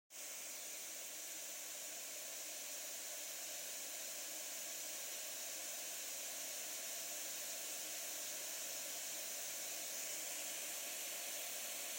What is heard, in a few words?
TV Static; Odimog; Owisfx